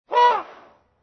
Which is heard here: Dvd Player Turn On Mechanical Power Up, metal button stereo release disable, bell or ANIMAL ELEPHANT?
ANIMAL ELEPHANT